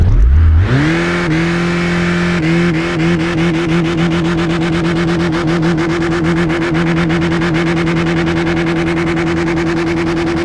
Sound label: rev, vehicles cars; lwcars